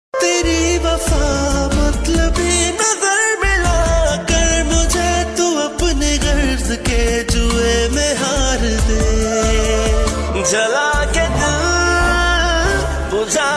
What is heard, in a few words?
OST pakistani ringtone